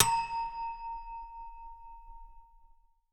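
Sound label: Choir Railings Ride, Music Hall Percussion; Aberdeen Music Hall; Drum Hits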